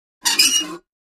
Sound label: Metal Scrape On Metal